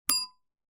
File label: BELL DESK